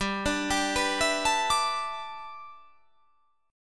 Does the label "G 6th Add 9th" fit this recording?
Yes